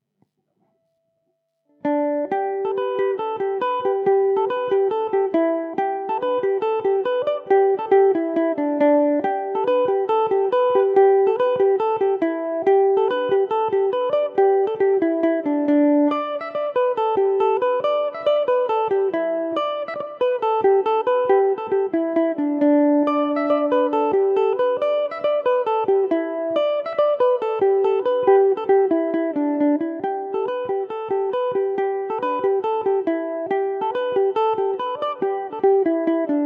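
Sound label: G major revelation, Irish mandolin; britches full of stitches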